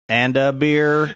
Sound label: singing and a beer